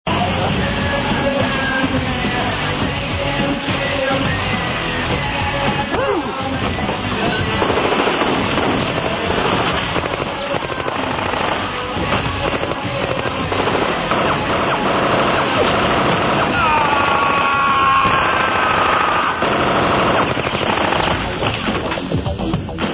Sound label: Gunfire